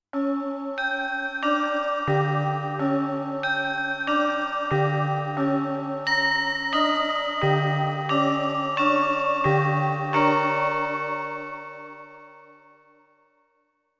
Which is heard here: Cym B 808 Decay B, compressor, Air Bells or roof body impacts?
Air Bells